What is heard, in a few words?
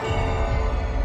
chime; vecna; stranger things